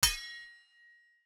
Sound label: Sword Clang; soundscrate